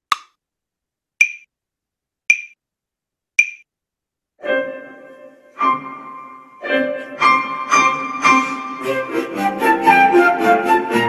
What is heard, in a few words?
110 bpm flute piano